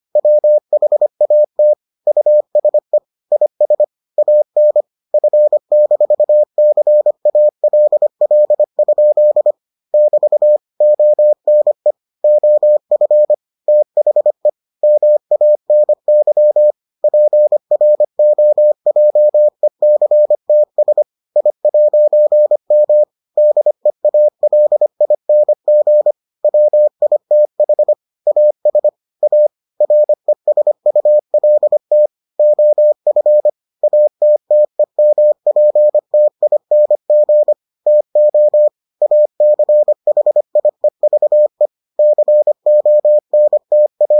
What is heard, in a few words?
morse